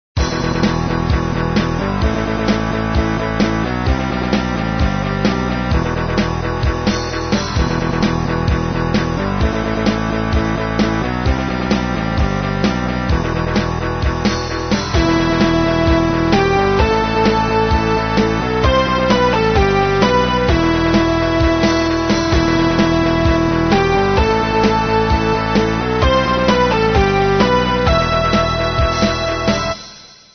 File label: midi